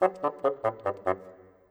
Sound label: Bassoon, Orchestral; Sonic Foundry (Sony Creative Software); Orchestral VOL-2; Modern 13